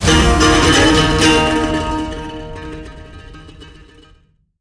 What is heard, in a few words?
battle ballad; jester